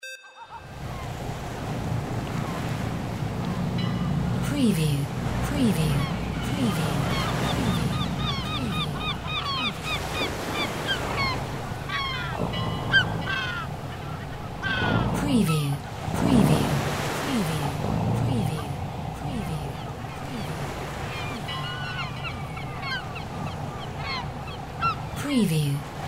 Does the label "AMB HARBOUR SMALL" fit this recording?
Yes